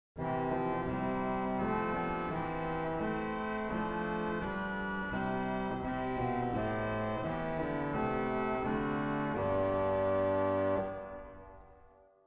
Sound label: Basson; CavColMainz